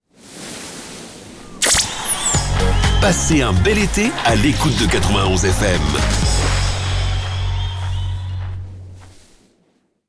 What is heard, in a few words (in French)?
Jingle Eté